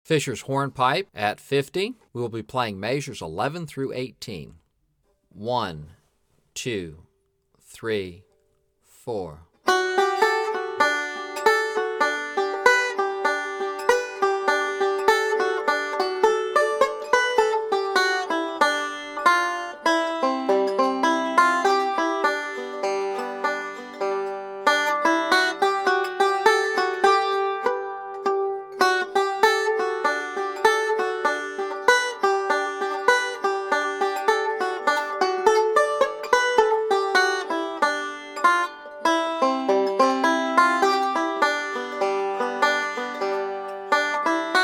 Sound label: Break 2nd Half 50 Bpm; Fishers Hornpipe Break 1